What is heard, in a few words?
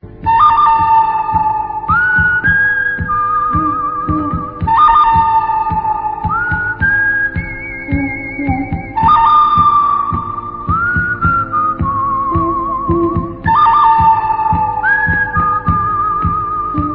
whistle long; goodbadugly; AQ2